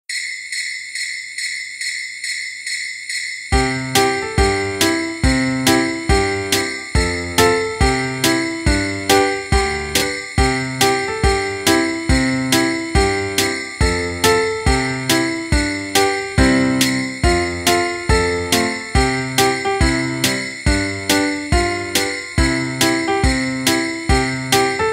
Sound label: Up on the Housetop (Instrumental)